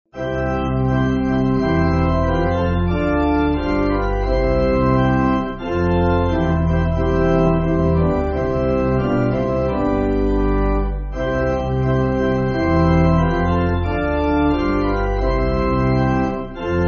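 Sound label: Pipe Organ, Music Backing Files for Churches; Help Us Jesu; Pedersen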